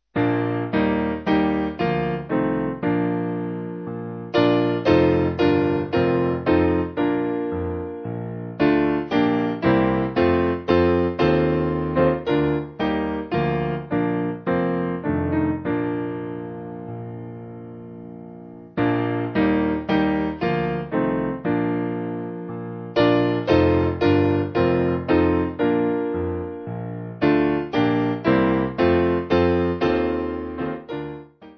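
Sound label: Piano, Music Backing Files for Churches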